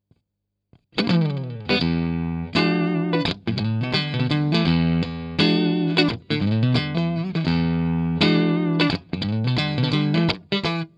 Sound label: Clean Neck PU Single Coil